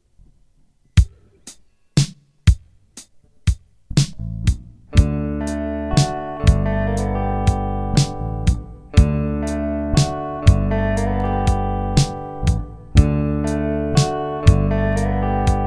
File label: D G Chord Melody